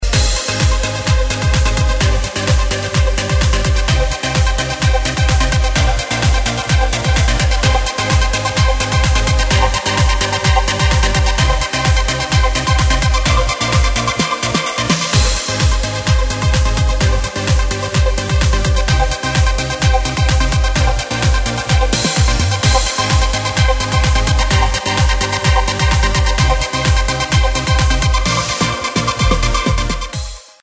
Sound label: NightLife Electronic Ringtone